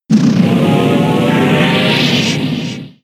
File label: Flower Shield; Pokemon; gen 6; XY; ORAS